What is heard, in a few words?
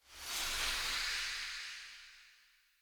Short Sword Melee Wind Up; Weapons; Player